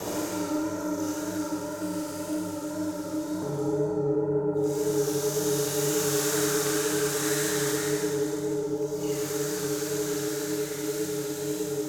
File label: Tibetan Bowl and Rainstick, Atmos, Wind; Cymbals; 344 Audio; Cymbals From Hell Vol 3